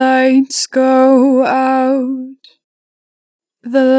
The lights go out, 2 bar vib, Vocals, Natural Vibrato; Harmor Vocals